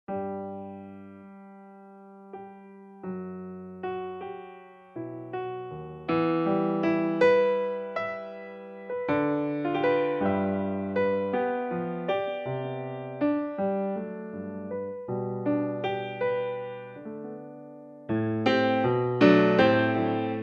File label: Rain